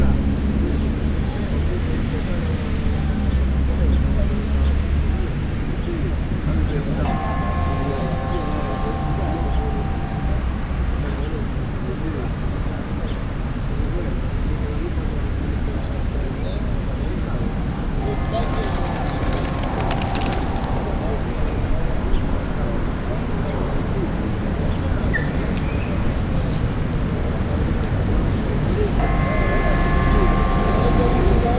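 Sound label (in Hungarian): kongatás felrebbenő galambok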